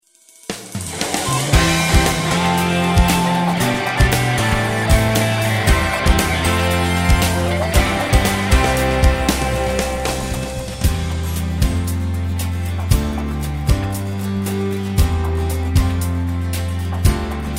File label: guitars